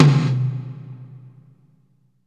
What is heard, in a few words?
GATE, toms; Sampleheads; New York City Drumworks VOL-1; KD TOMS